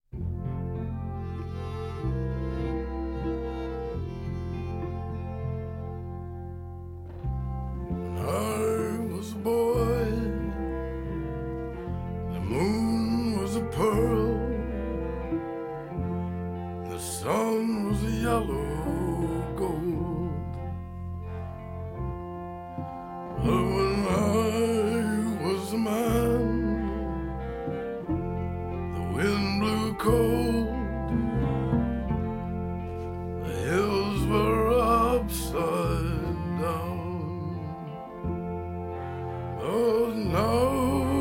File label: Waltz